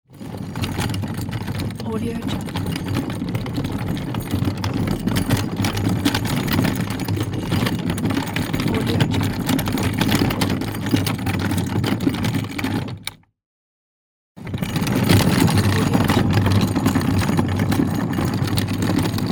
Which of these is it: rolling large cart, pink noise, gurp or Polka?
rolling large cart